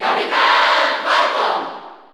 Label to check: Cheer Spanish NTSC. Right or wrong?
Right